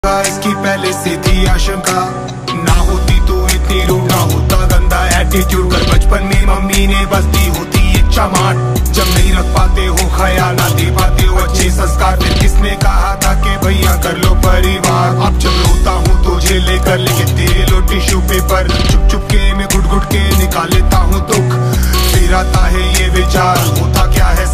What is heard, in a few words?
hindi rap ringtone